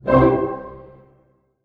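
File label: C major pianissimo, orchestra hits; Sound On Sound - Peter Siedleczek's Orchestra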